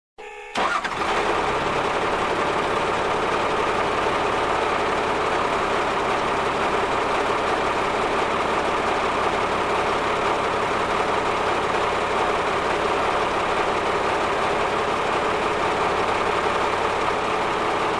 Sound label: Construction